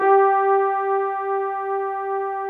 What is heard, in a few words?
BRASS G3, ORCH BRASS; Greytsounds VOL-1; ORCH BRASS 2